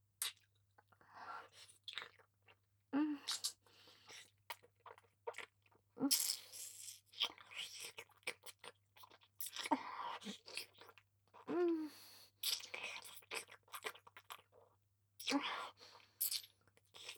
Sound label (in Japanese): 乳首舐め・普通 右, ASMR